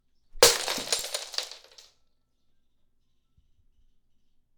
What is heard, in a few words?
Plate Breaking